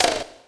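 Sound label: ruler, Misc Percussion Ticks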